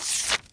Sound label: PAPER; DriverEd; Lessons